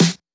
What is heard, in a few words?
Dance snare; Pop